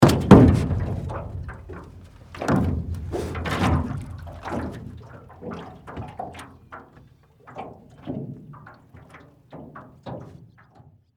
Transportation, Watercraft, Boat, Step, Into, Aluminum, Canoe; Boat Sound Effects